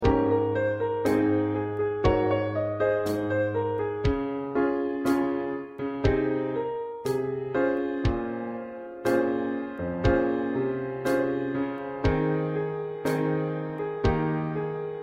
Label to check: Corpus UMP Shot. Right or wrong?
Wrong